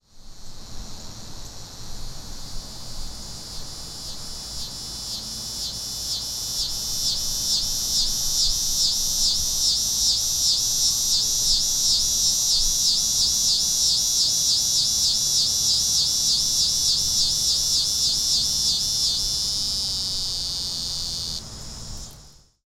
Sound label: Eastern Scissor Grinder, a slow pulsating drone, building in volume, then usually ending with an extended slur note. The sound clip is a solo male’s courtship efforts.